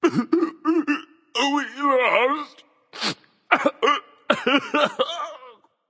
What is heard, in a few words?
woohoo; smite; voicepack; poseidon